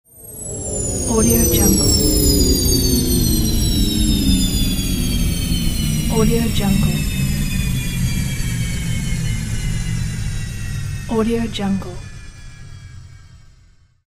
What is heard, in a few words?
space car